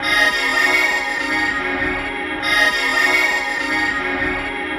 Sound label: Muted Strings; Sonic Foundry (Sony Creative Software); Myths of Technology